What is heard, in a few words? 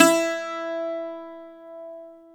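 BZ F E, bouzouki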